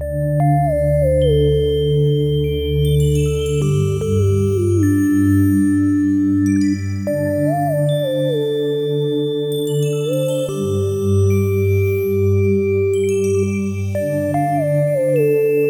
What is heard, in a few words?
Foglands, fantasy